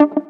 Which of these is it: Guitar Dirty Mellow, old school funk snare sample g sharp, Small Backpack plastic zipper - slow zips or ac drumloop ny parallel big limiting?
Guitar Dirty Mellow